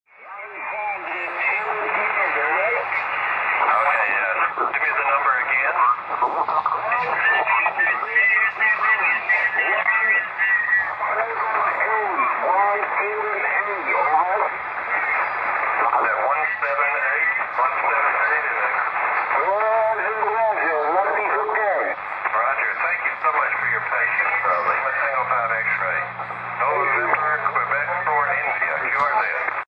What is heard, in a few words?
on 20 Meters